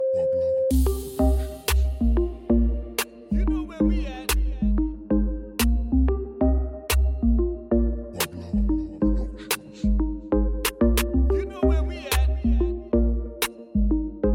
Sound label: Reggae Dancehall